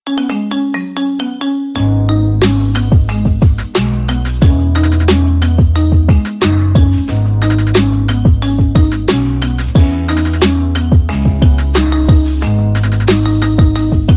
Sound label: marimba remix ringtone